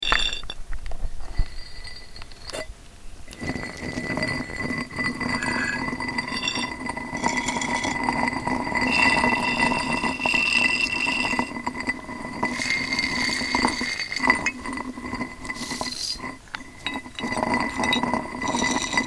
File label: rocks